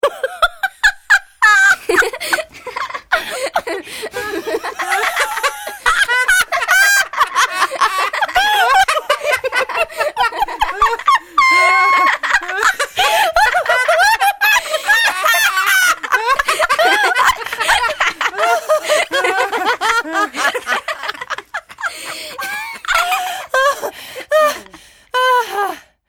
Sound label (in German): Lachen mix